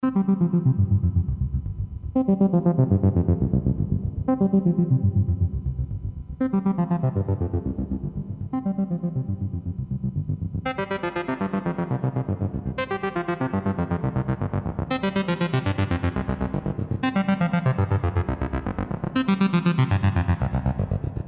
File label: minimoog va